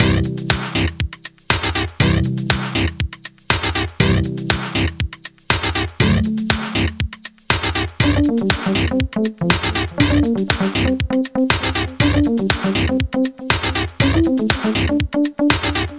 Pop Rock Loop

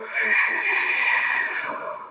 Zombie

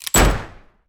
Gunshot Pitch A

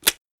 Basic clicking

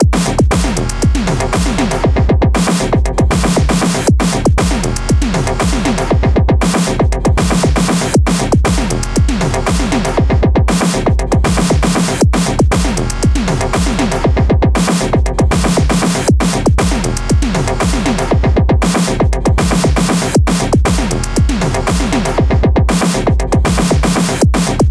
Action Chase

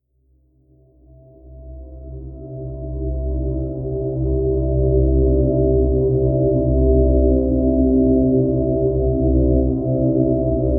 Pad Dreaming Resonance C2

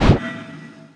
Pickups Armor Chest

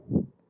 Transition Whoosh Sound

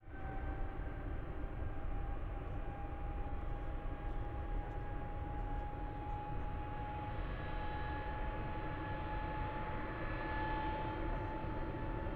Airbus A320 214 Take Off

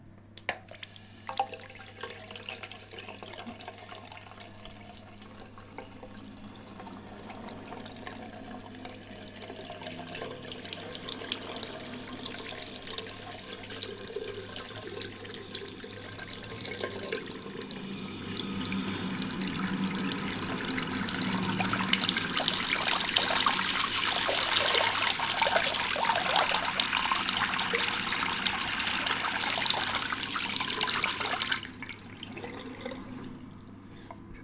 Taps

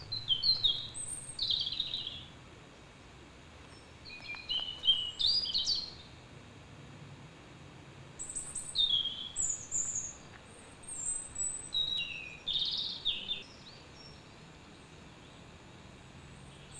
Saltwells Nature Reserve Birdsong

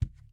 Bow Impact

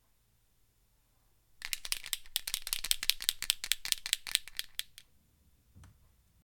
Shake Marker

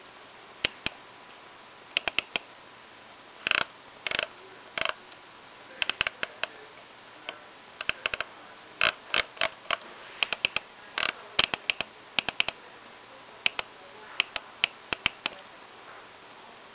Mouse

